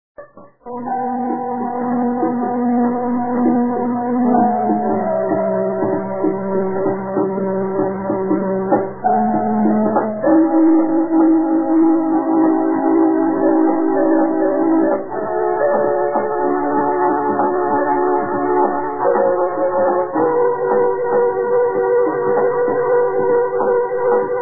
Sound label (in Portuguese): piano lento